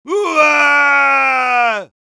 yell, es

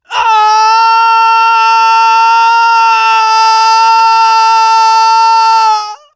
falling; Scout

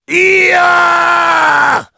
battlecry; Heavy; "Yaaaaaaaaaaaagh!"

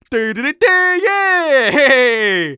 Scout taunt conga fun

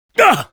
pain sharp, fr

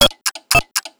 sentry buster loop

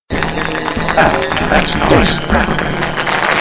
round of applause

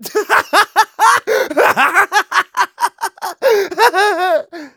Scout laugh long, de

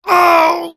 Demoman pain severe, fr

